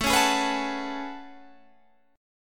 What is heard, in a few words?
A Major 13th, down Guitar Standard; AM13 strummed